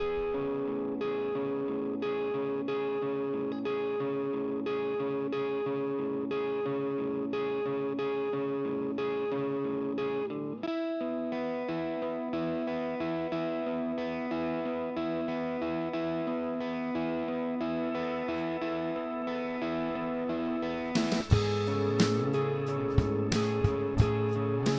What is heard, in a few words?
loop test